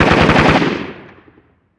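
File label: gun m16 medium; ModernWarfare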